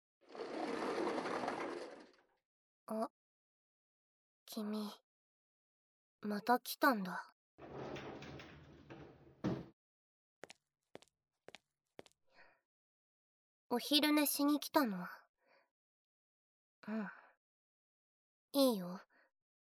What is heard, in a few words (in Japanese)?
PCのキーボード音を聞きながら昼寝, ASMR; ２年E組・秋原由水編